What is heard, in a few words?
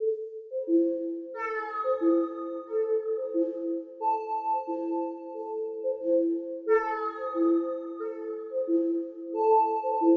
Quirky amzingment